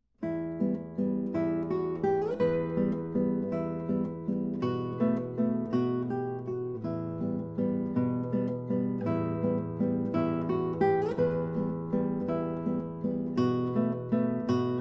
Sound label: Vals, Guitar; Bartolome Calatayud